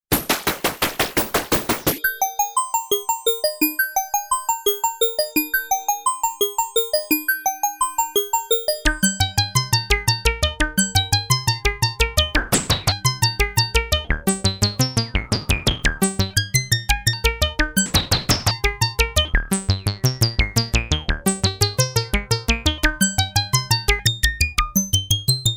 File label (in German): P600 Step Sequenzer; gligli